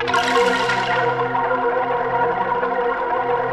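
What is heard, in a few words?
WATER WAVES; Pro-Rec - Synthsonic; JV FILM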